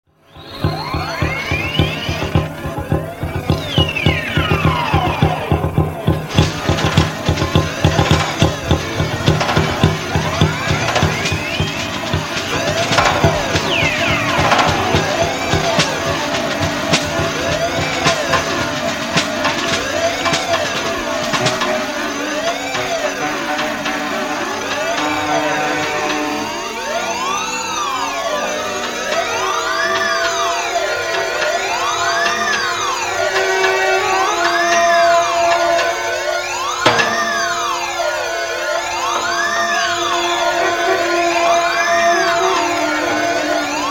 Instrumental

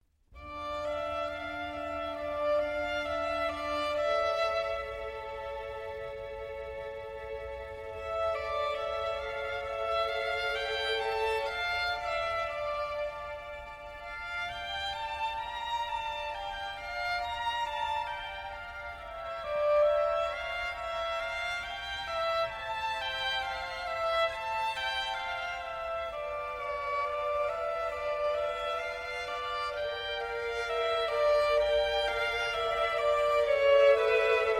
Concerto in A minor for solo violin; 12 Concertos, Op.3; L'estro armonico; Concerto No. 6; RV356; Vivaldi; Academy of St. Martin in the Fields

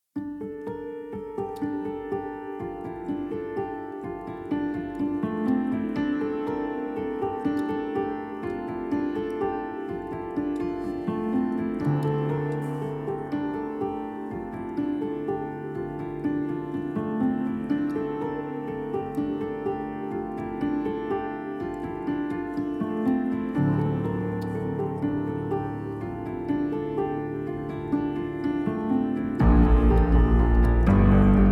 Instrumental

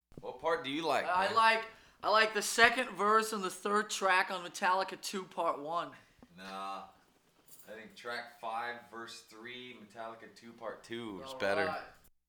Riff Tape Intro

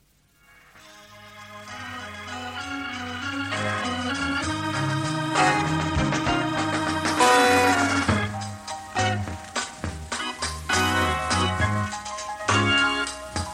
Instrumental

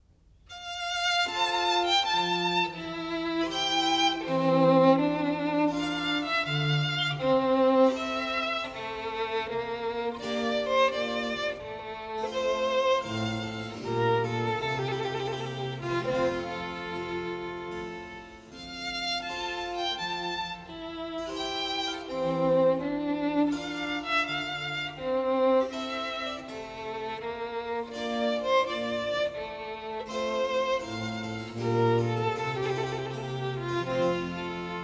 Violin Sonata in F Major, Sarabanda; Corelli; Arr Donington; Op. 5 No. 10; III. Sarabanda (Largo); The Menuhin Century; Yehudi Menuhin

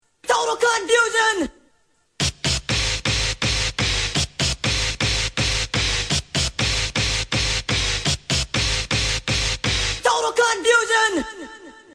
Synth

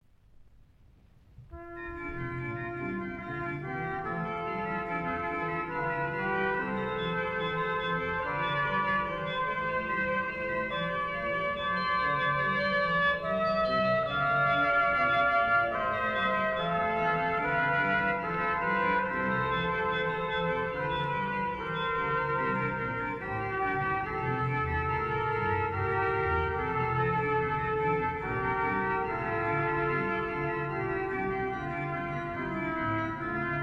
Arranged for Organ and Brass Ensemble; Gottes Sohn ist kommen, BWV 703; Pierre Cochereau

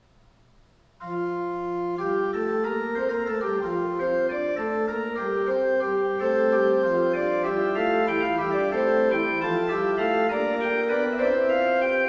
Fugue, Organ; Magnificat quinti toni; Johann Pachelbel; Complete Organ Works

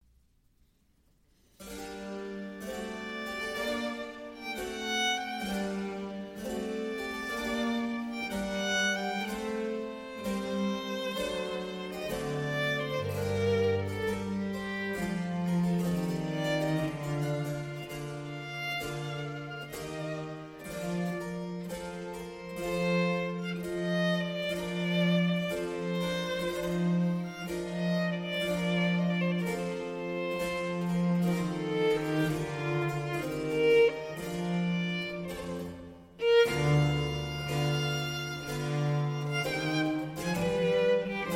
Violin Sonata in B-Flat Major, Largo; Vivaldi; RV 34; Sonate di Dresda

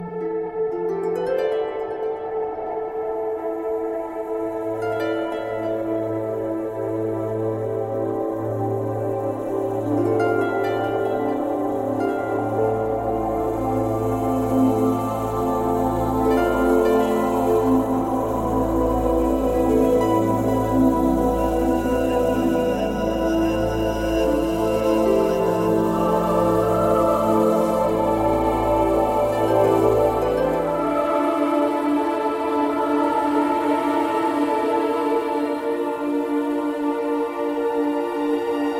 instrumental

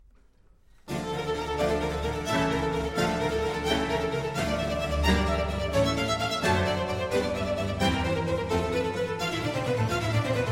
Concerto for Strings in D Minor, Allegro; Vivaldi; Concerto Italiano

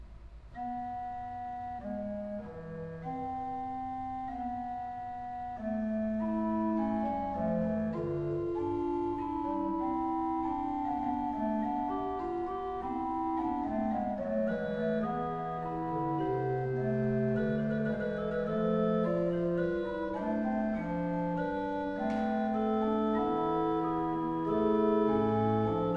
Fugue, Organ

